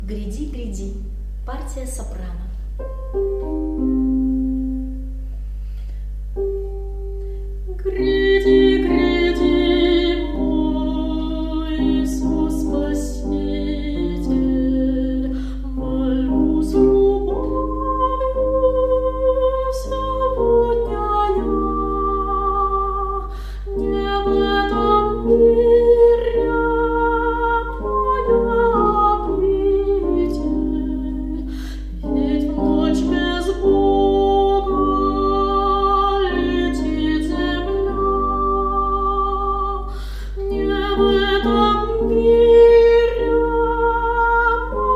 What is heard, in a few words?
soprano; epiphany; gryadi gryadi